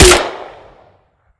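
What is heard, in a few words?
grenade launcher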